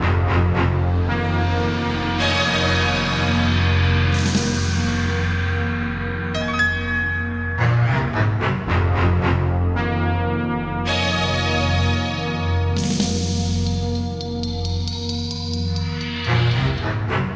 keys; Sad

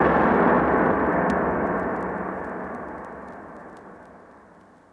electric explosion; Crash Bang Boom

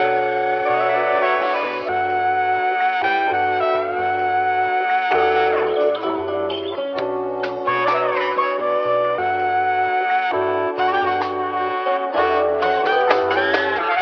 horns, Loops

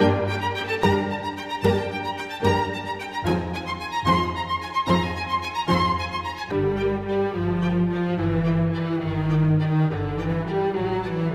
strings, Sad

